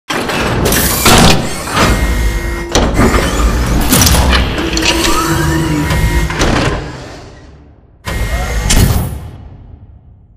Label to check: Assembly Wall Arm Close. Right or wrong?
Right